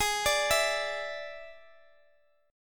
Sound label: D Suspended 2nd Flat 5th G sharp, guitar